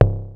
Bass Drum, Cwejman S1 MK2, Drum Machines

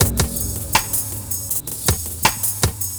Beat, Futurist Drum & Bass; Drums & Percussion

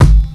Misc Kik, Drum Machines, E-MU MoPhatt; 300 Drum Machines

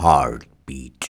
VOX, Singin

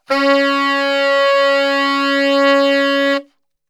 C#2 SAX STR, Brass; Best Service; Brass Super Section

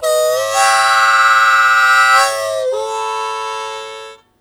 Blues Chord Attack A1, Harmonica; Zero G - Harmonica

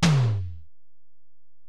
Tom, Alesis DM-PRO, Drum Machines, Toms

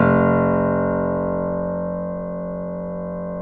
Keyboards Acoustic, F0; USB Soundscan vol.17; AKAI

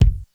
Kick, Korg DSS-1 Drums

